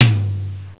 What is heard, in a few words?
tom3